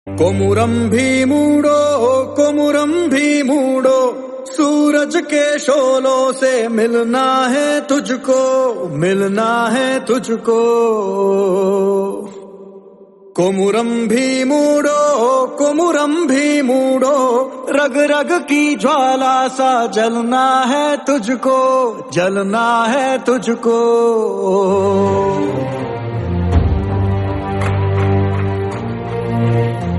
Hindi Song

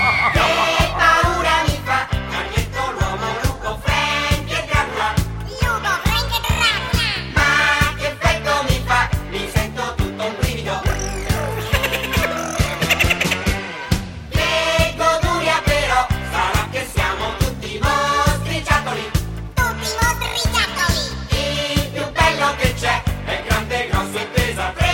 ringtone

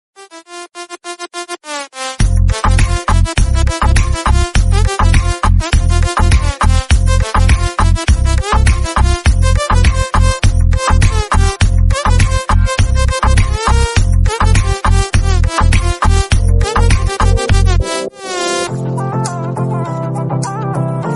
Instrumental

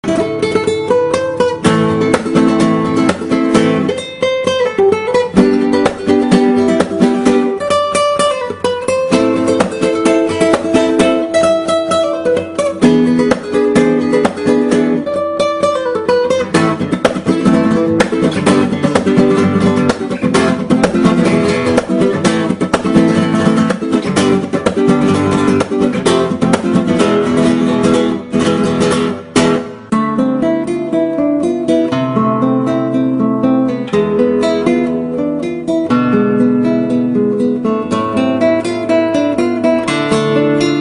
spanish guitar phone

